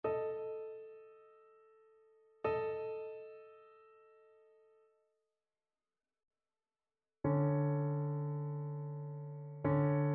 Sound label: intervals